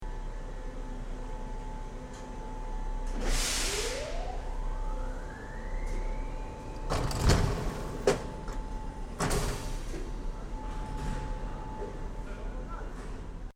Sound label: Europe France Paris City Metro Door Opening Air Pressure Release Electric